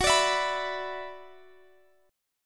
F sharp 7th Suspended 4th Sharp 5th, down, Guitar, Drop D